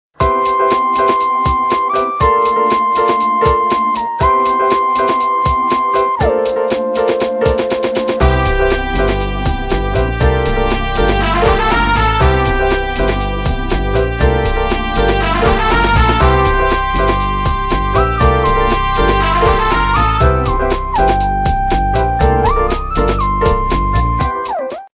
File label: Down Tempo; N72